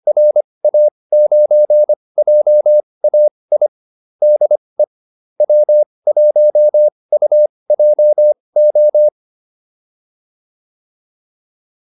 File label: QSO, advanced practice files